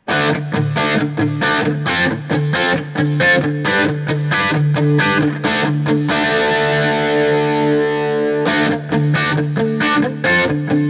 Guild S60 Backline D Shape, guitar; 1977; New Guild Shape guitar from the late 1970s called an S-60

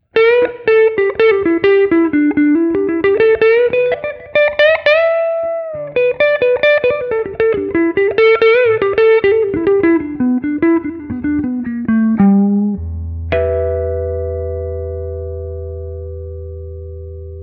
Guild X700 RC120 12th fret noodling

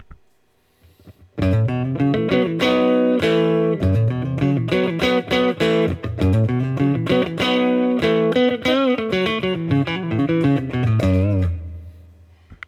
Guild Bluesbird Neck Tiny Tweed, guitar; 2016 guild newark street bluesbird guitar

